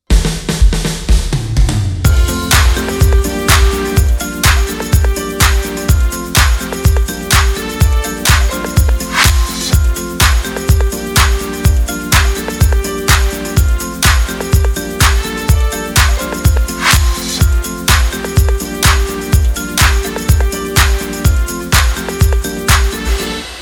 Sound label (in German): Zugabe Playback